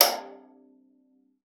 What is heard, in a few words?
VIOLA FINGER; Xsample VOL-5